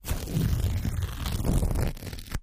Heavy fabric is torn into strips. Tear, Fabric Rip, Fabric